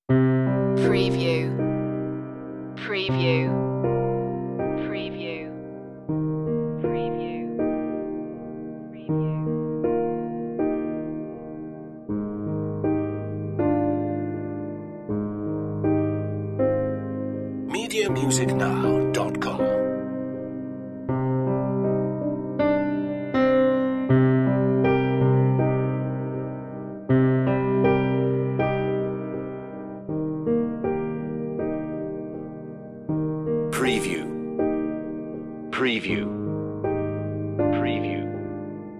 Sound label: the saddest ballad no pad, wailing